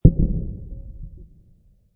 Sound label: Amb Hit, Sound design - Ambient hit; Sound design - Ambient hit - Variation 3; Objects; Michael Huang